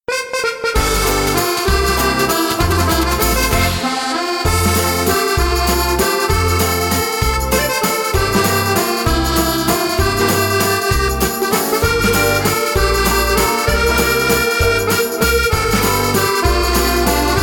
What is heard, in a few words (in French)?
valse musette